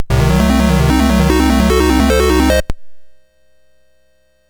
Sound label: Dragonworld sid MOS6581R4